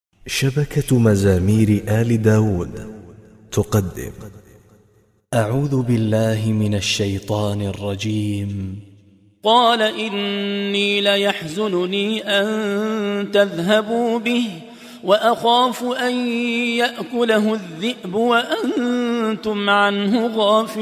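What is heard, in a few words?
Saba Hijaz transitions; Maqamat; Saba-7ijaz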